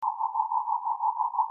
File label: bark